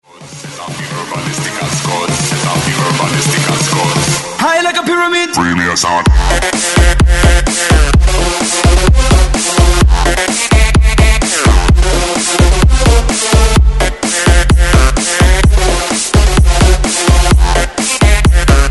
BREAKMIX; DJDOLLS

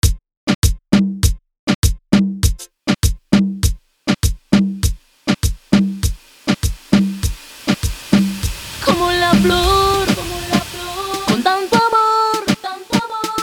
Transition Reggaeton To Cumbia

